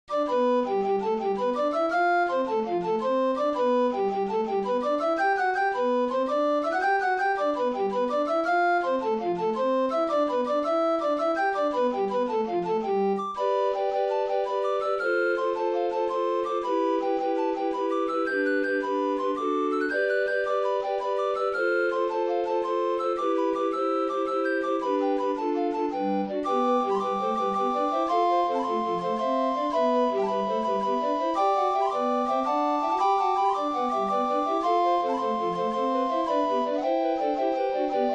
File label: rattling roarin willie recorders